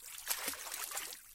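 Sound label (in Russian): небольшой всплеск волны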